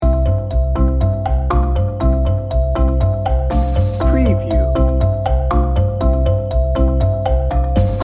children marching loop

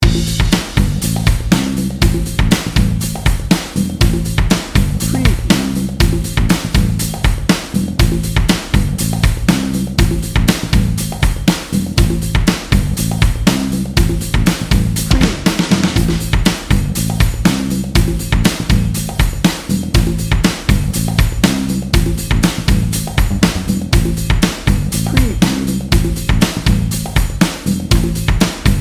funky and fun

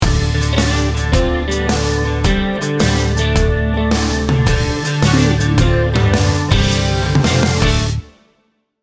big wheel trucker sting bumper

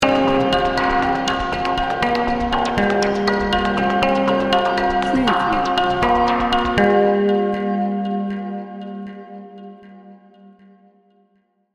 zen beach sting bumper

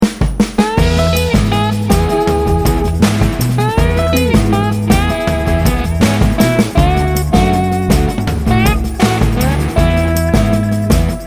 new orleans slide

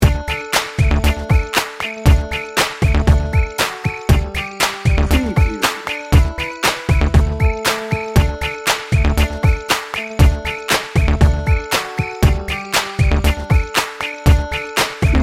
jingle bells bed

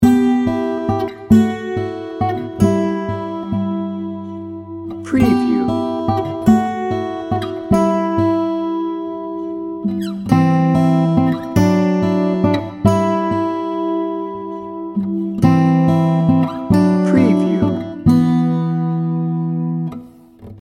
warm drama loop

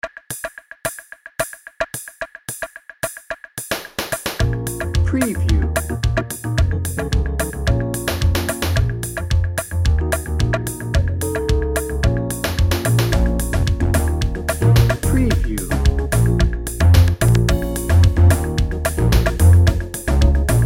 samba shoes bed

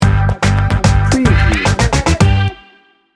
modern fashion sting bumper

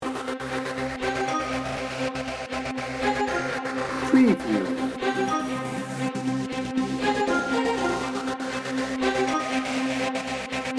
warrior march no drums

